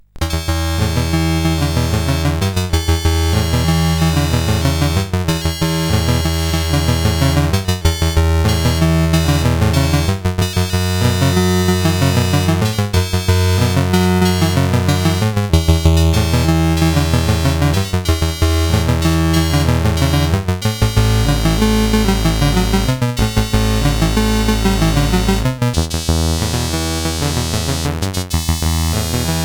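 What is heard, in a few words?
Magicians Ball, MOS 8580 SID chip music, games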